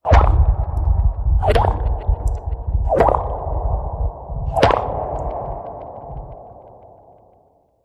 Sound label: Hollow Drips; Red Dawn